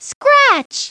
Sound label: scratch; Treasure Galaxy; Learning Company